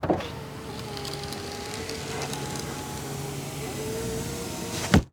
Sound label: Car Monte Carlo Exterior Power Window Roll Up; transportation